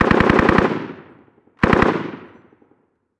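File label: gun m240 distant; ModernWarfare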